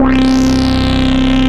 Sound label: Retro Odyssey, Bass; Ableton; Factory Packs; Creative Extensions